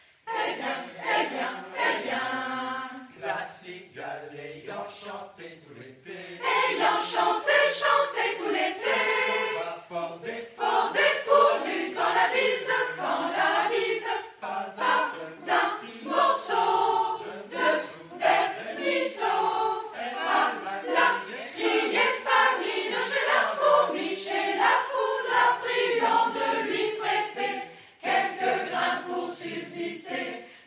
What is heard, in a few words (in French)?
cigale; La cigale et la fourmi